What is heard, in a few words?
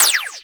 Fire Shot, Weapons; Burston Prime; Warframe